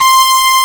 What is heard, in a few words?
PWM BRA C5